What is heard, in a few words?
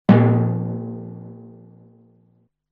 Timpani; Dystopia: synth patches Korg Prologue; Timpani 1.0